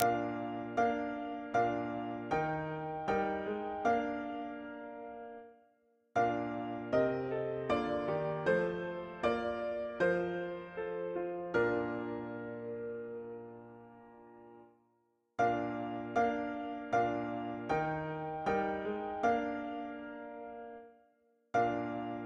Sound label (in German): chor